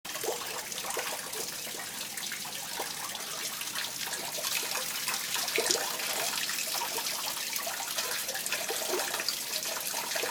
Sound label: ripples